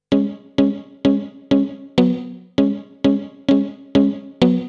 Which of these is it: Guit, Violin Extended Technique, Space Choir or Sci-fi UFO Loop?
Guit